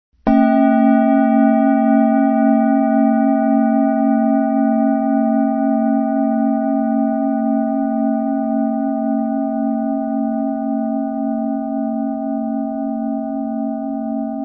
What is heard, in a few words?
Fire Sample